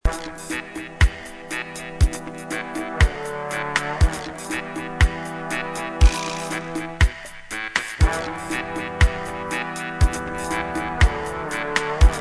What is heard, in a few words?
horn of africa dub